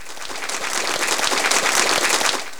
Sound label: applause; SFX; GMPlay